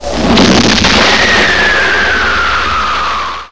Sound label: cannon; gunslinger